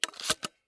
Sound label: insert, Shotgun